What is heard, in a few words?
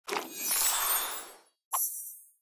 Roll Move